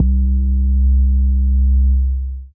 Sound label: Bending Boom; Booms; Ableton; Factory Packs; Chop and Swing